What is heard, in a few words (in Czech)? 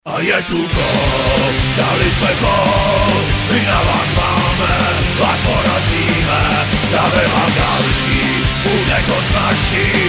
gol domaci